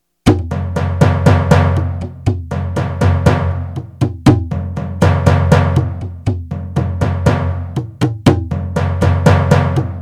Drum Loop

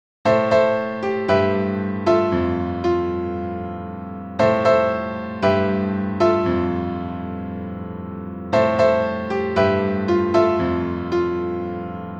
drama piano

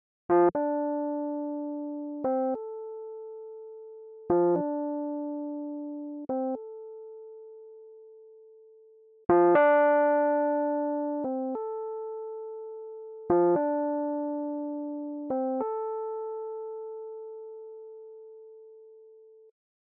riffy Rhodes

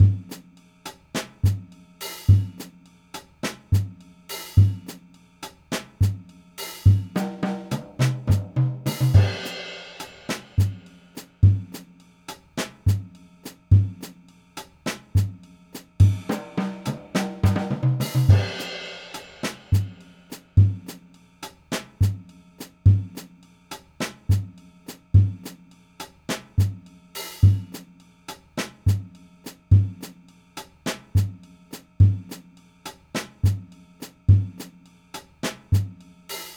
March of the Bells

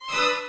Scary Psycho Monster Bb2

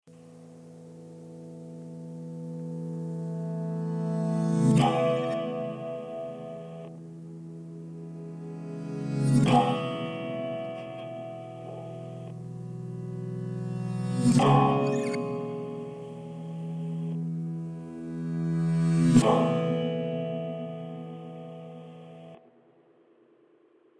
E 100 GTR chords reverse Reverb

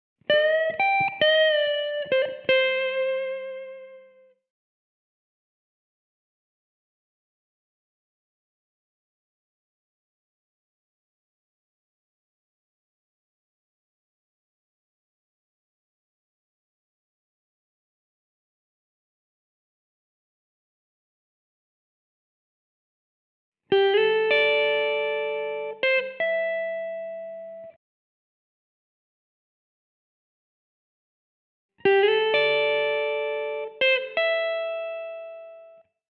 Blues Jazz A minor 120BPM

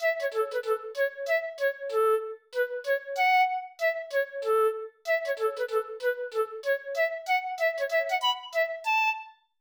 Happy Munchkin Music

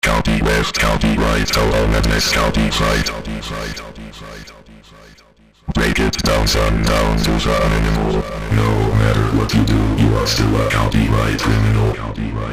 Sinthedic Voices

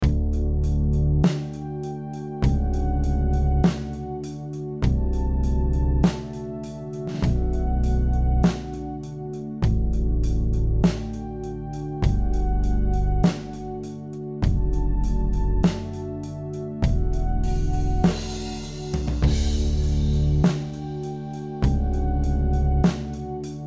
D100 Baroque Groove

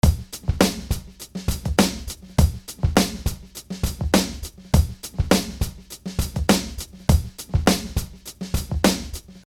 hip hop funk drum beat 102 bpm

